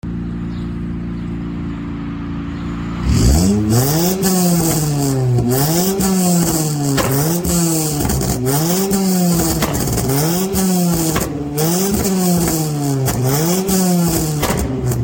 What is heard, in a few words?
Bee exhaust